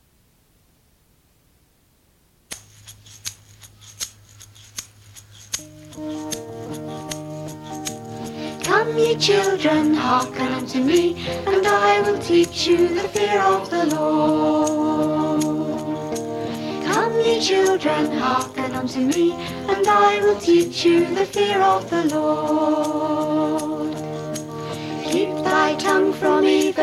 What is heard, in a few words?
Come Ye Children